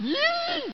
Pain; KKND

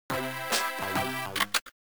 BGM Speed Up; WarioWare, Inc.

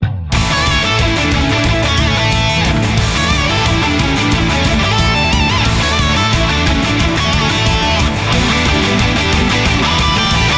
Champ Start; Gran Turismo 5

